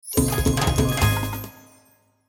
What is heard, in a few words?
win; House Of Luck